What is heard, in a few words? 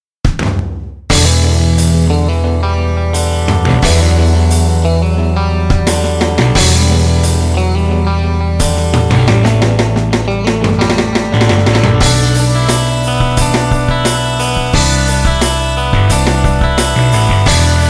patch demo